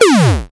sound design digital synth hit blip thud; zapsplat

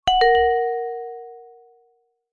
multimedia alert chime short musical notification; zapsplat

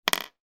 household earring put down on table

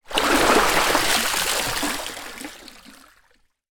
water movement plastic chair lift out; zapsplat

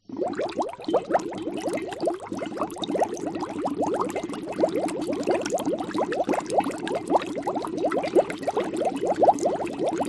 horror cauldron bubbling; zapsplat

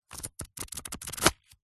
paper raffle ticket book flick through pages

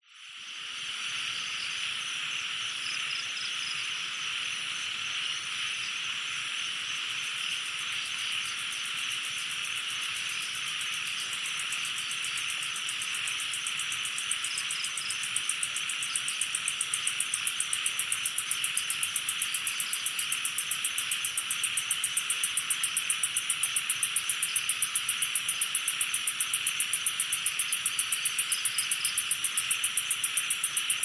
thailand jungle night pond frogs insects

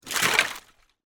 footstep on broken loose ice water splash; foley

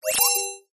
science fiction machine small on activate beep

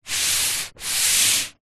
household book slide table

